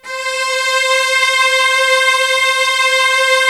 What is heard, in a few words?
C4 mid, lush strings; InVision Interactive; Lightware VOL-1; Instruments & Percussions